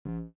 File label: lightsword swing swipes; soundscrate